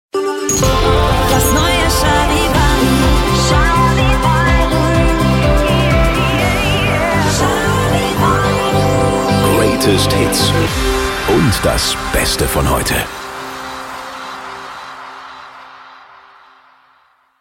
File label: Transition Hot to Soft Ambient Rock; Charivari W